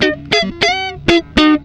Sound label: SOLO LICK, Gtr; PlatinumPhattCD2; E-MU Producer Series Vol. 8 – Platinum Phatt